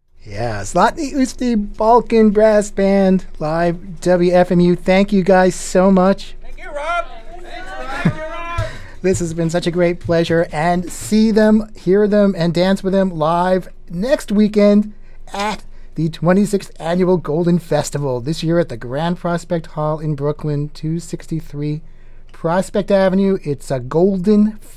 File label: Balkan Brass Band; Live WFMU on Transpacific Sound Paradise Jan 9 2011